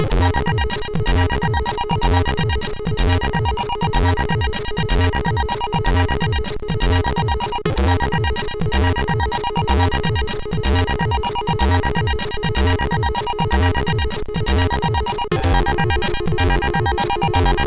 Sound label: Tranc2, MOS6581R3